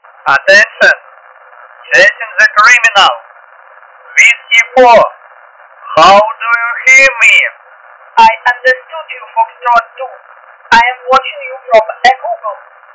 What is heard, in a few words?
helicopter